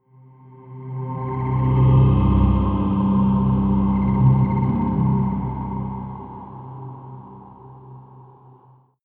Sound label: mystic rising, drones